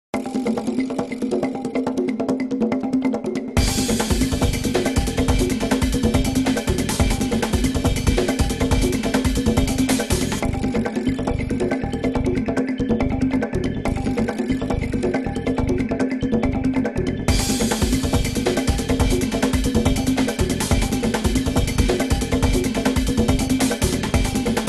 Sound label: Jungle Beat